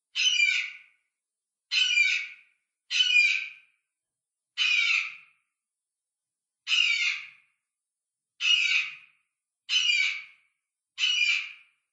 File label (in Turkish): Sahin Sesi